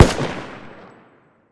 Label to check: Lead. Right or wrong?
Wrong